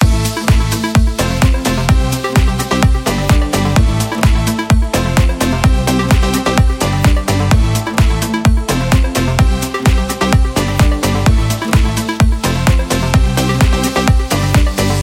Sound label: Fitness Motivation Loop; MIUI14 and HyperOS 1.0